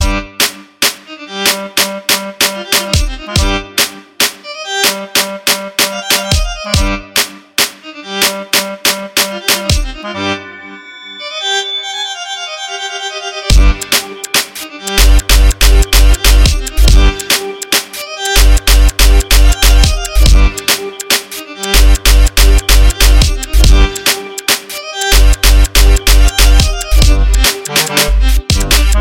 riddim

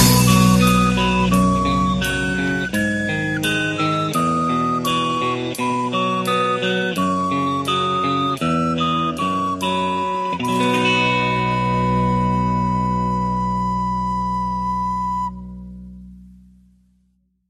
flute; naruto

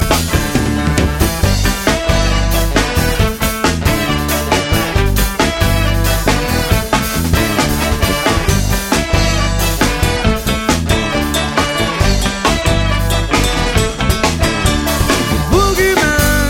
reggae

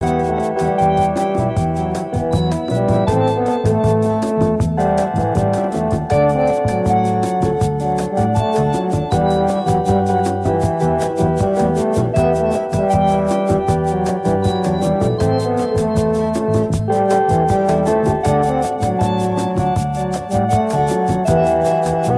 romantic instrumental

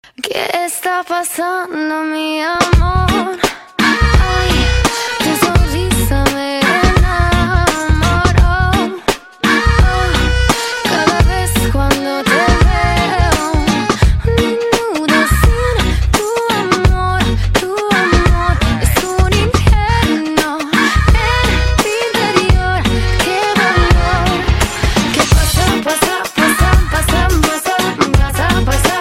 trumpet lights

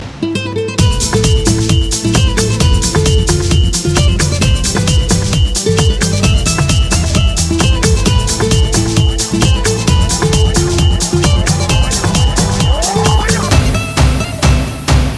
guitar fiesta mix

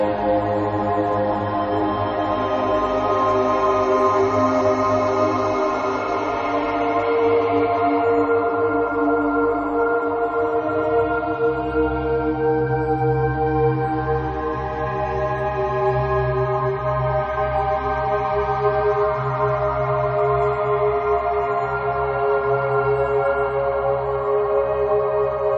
emotional music; halo 2

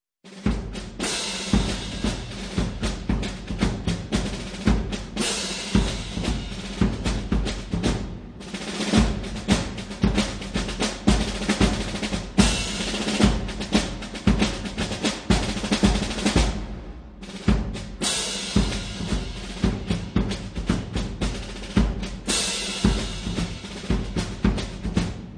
drum cadence

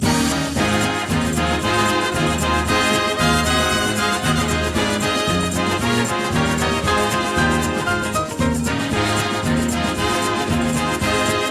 wedding samba

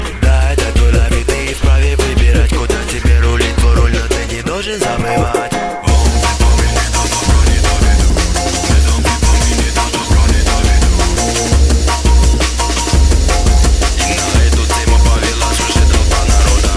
drum and bass tone

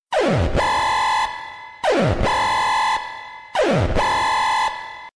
party alarm

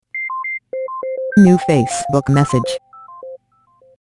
facebook message

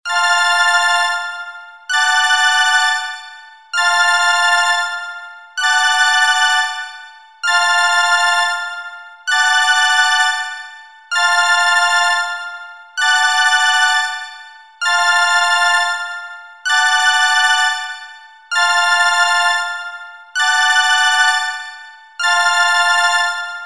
joybell ringtone

